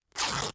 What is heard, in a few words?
FABRIC Tear; FABRIC & CLOTHING; mono; Universal Sound FX